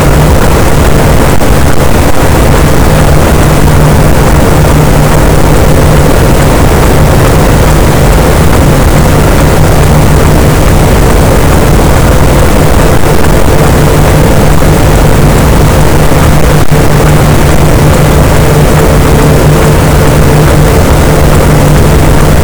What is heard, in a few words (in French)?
cachalot negatif, gaussian noise, Physeter Macrocephalus; SNR 24dB